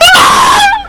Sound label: superjump; freak fortress 2; proplayer scout; malee